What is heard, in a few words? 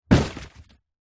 foley cardboard box large drop or impact